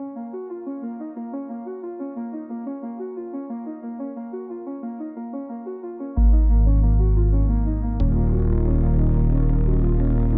a very slow and haunting electronic soundtrack in the retrowave genre using 1980s synthesizers